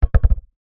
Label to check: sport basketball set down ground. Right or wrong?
Right